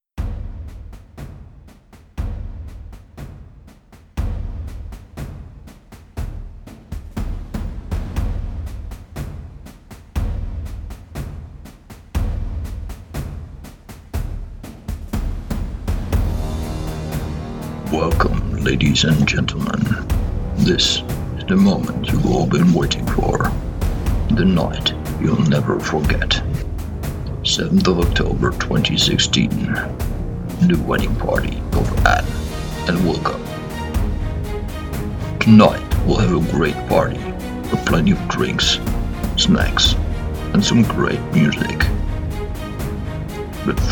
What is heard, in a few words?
Hardstyle festival